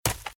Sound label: Footsteps - Leaves - Sprint - Left; RadwinIsland